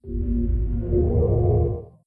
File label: Windows Startup; Windows Never Released Soundpack 2